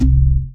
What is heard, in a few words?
DM ORG1, Dance Mega Synth; Best Service; 90 s Sample CDs